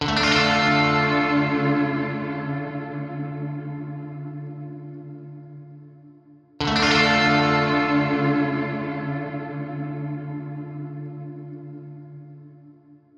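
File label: guitar no diffusion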